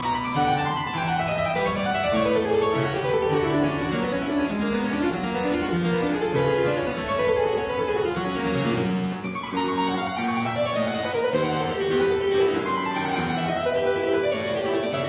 Lute Partita in C minor arr for harpsichord, Double of the Gigue; BWV 997